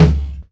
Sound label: Closed Hats